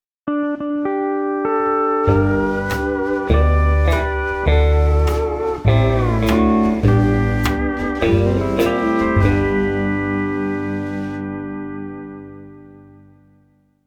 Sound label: COUNTRY Mellow, Windows Mobile ringtones; Microsoft